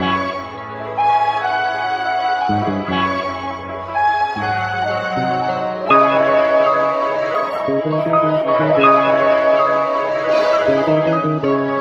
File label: horns and strings, phonky loops